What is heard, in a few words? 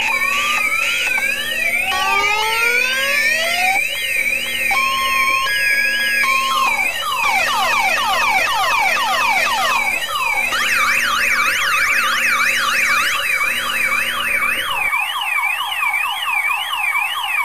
Multi Alarms